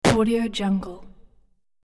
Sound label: pixels crunch hit